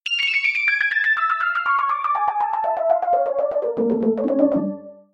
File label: soft piano